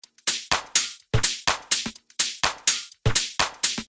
Top Loop, 125 BPM

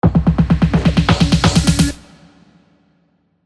Drum Fill (128 BPM)

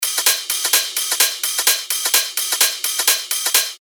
128 BPM

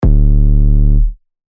Eight Zero Eight

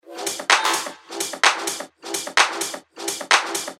128 BPM; Railway

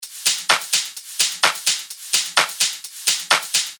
128 BPM

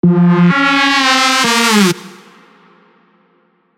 Synth Fill F (128 BPM)

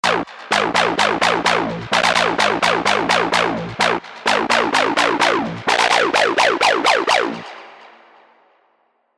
LD Bark; Spire

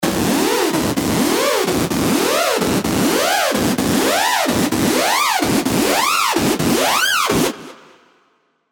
Tonal Risers 128 BPM 4bar D

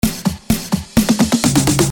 Drum Fill (128 BPM)

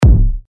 Kick C#; TECHNO; TECHNOKICKS2

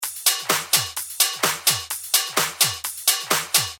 128 BPM; Custom

